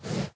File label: breathe, horse; minecraft